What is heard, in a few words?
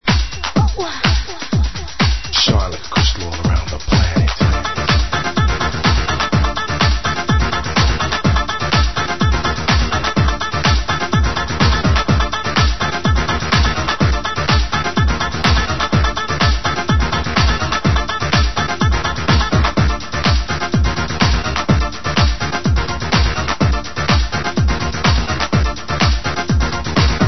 rave modular